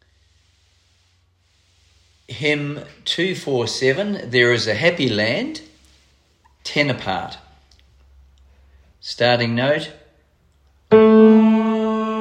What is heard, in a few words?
Tenor; There Is A Happy Land; SATB; Hymns for Jubilation; house choir